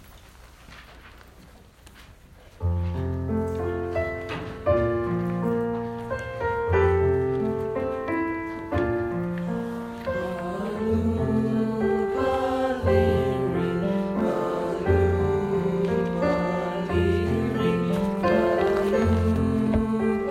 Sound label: bali vocals